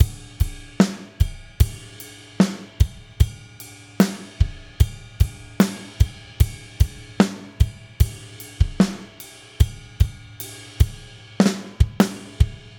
drums normalisiert fet compressor

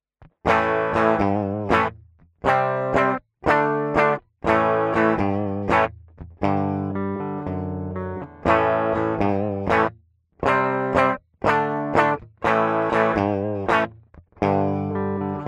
les paul direkt; palmer daccapo testbericht

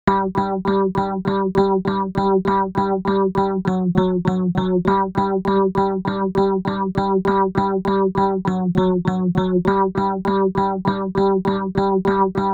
Moog multimode filter XL test e-piano pattern modulation saegezahn low; universal audio

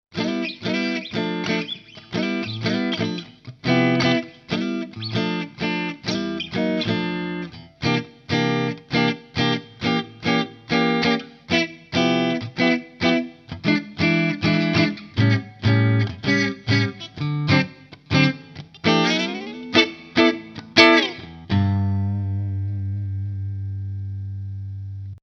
Harley Benton TE-70 test hals und steg

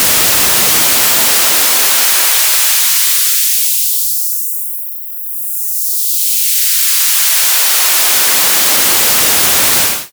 Moog multimode filter XL test rauschen high pass mittlere resonanz

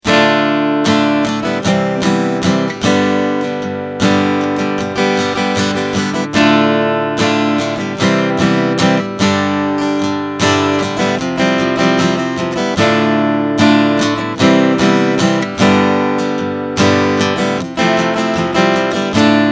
schlagmuster piezo mikro; dimavery stw-60

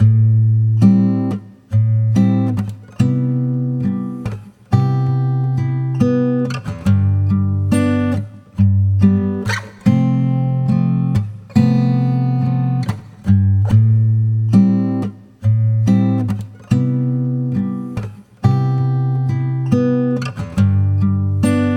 brainworx bx console acoustic guitar unbearbeitet

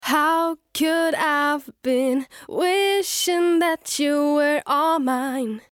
vocal ohne effekte; Gesang; Hall bei Gesang

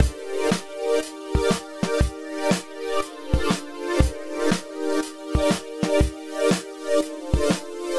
pad mit duck; softube valley people dyna-mite

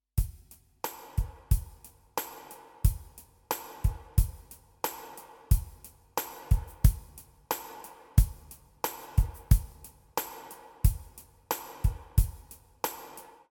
Akustik-Ballade; songwriting

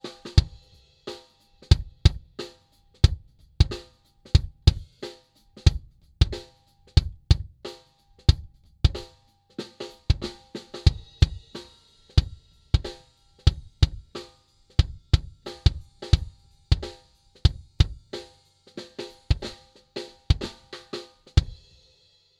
img stageline drumset kick drum solo im inneren vor dem fell

